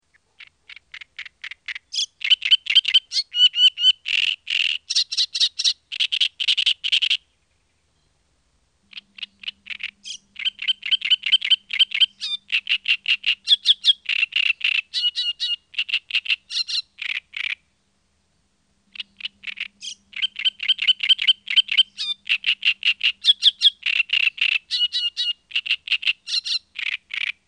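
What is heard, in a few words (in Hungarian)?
Nádirigó, Madarak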